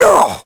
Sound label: vo hurt; Crypt of the NecroDancer